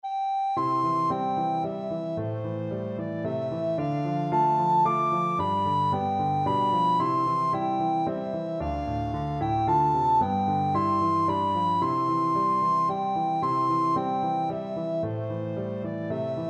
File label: cader idris recorder